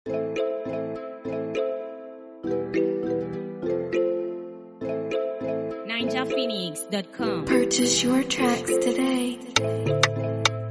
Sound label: Afrobeat Instrumental